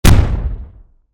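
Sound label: object hits ground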